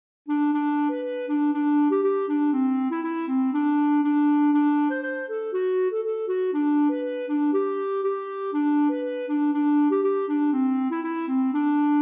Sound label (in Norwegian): Reinlendern; Nordanfje